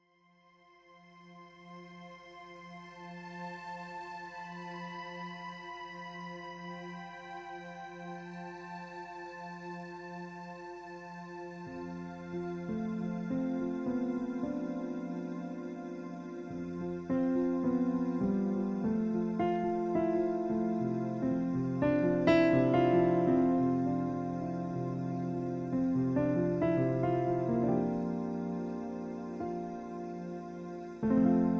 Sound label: Ambient